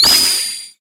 Enemy Hourgrass Minion Eyeflash; Disney Star Smash; Android, iOS, Mobile

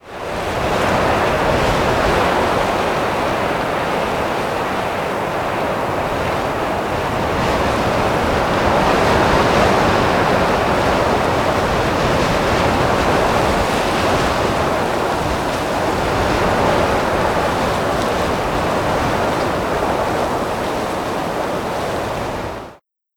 Cities Wind

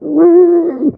Aaa Whine; Neighbours From Hell Console Edition; GC, Xbox

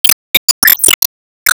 Btl Sp Trigger; Sp Reserve Loop

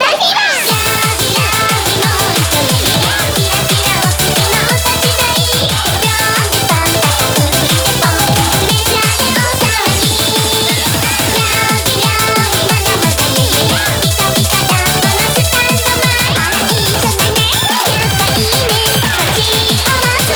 Bgm Reach

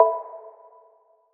OK Button

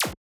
Fill Tech Zap 160

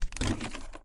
Shift To Drive; lethal company

